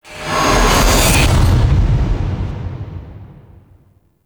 Ethereal Void Angel Beam Swipe Cast; Abilities; Warframe; Zariman

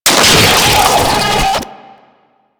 Auto Turret Agent Close; Warframe; Venus Turrets

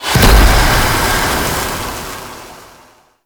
Void Dragon Firebreath Cast Poison; Enemies; Abilities; Warframe; Duviri

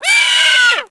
Sea Bird Vocal Death; Hunting Critters; Ostron Sea Bird

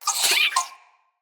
Floppet Player Vocal Gross, Animals

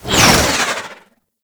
Concrete Archgun Projectile By; Warframe; Archwing

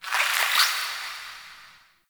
Critter Squeak; Warframe; Ambience; Nokko Colony

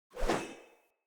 Sphere Polearm Heavy Whoosh Fill; Weapons; Player

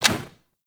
UI Transition Character; Warframe; Caliber Chicks Minigame; Title Menu

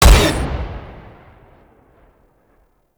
Long Gun Shot; Warframe; Weapons; Thano Tech Arch Long Gun

